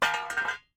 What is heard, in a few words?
iron stick